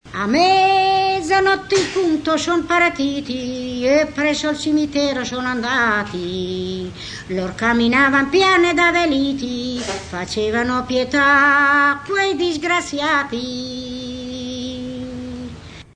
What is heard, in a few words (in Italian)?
stornello; a mezzanotte in punto son partiti